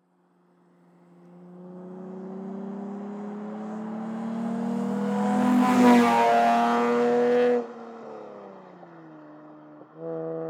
By, Stands, Stereo, Race Cars; Carlo Ascrizzi; Race Cars Essential Library

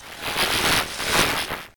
Glitch Transition; Glitches; Glitchedtones

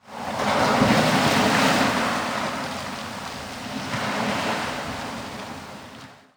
Waves; Robert Cordova; Base

